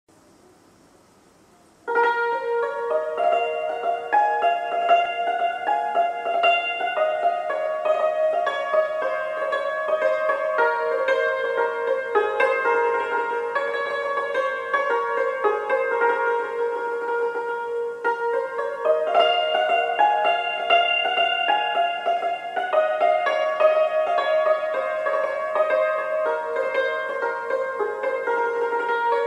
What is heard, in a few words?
Santur, سنتور